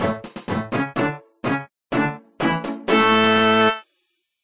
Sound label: Ranged level up; Old School RuneScape